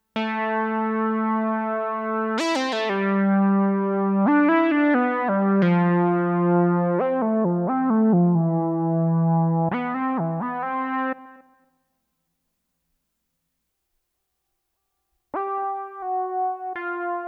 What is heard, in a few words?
Synth Lead